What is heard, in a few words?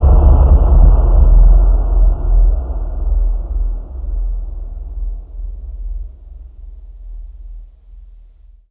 shock; horror